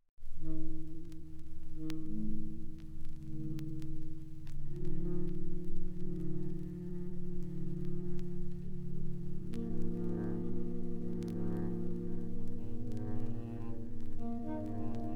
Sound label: Saxophone Orchestra